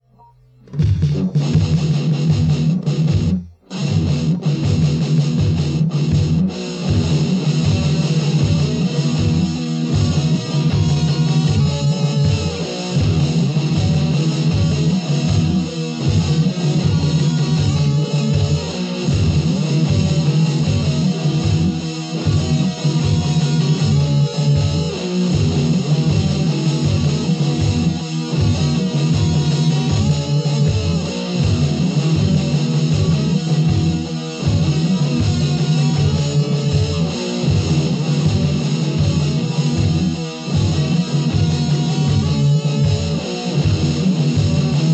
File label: Riff Tapes